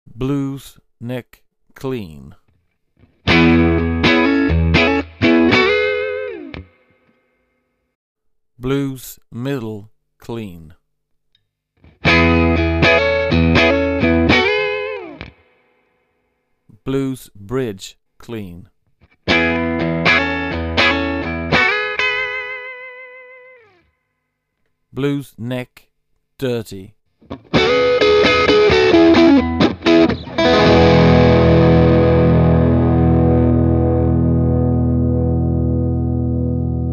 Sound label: Blues Strat, strat pickup bridge; lundgren